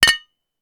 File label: Bottle beer cheers; lifestyle